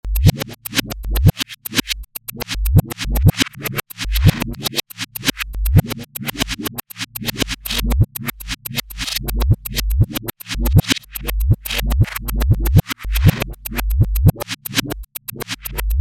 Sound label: drums